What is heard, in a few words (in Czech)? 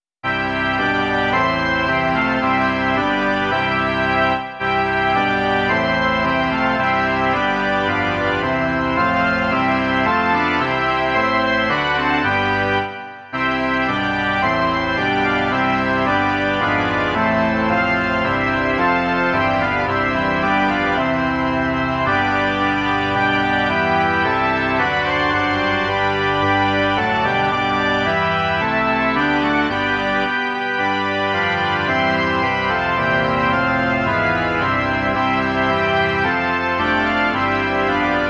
varhany; Svatý Duchu, rač v nás Pánu